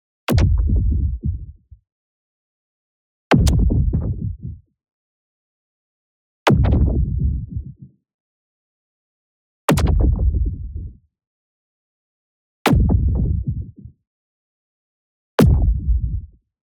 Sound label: IMPACT SMALL Diving Thud; boom library; Matter